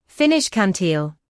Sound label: Finnish kantele